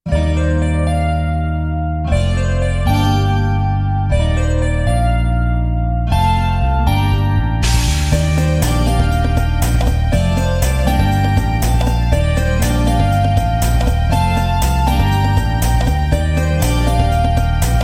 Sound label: Dungeon